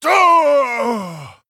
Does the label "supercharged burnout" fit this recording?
No